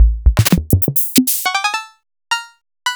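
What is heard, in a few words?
Roland MV8800 drum 808 KIT; V-808 KIT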